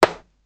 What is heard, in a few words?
crossbow shoot sound simp, archery